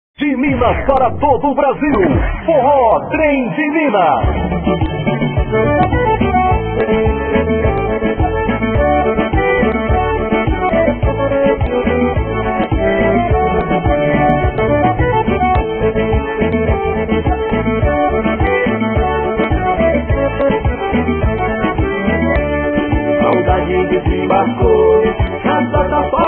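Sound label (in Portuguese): xote saudade forró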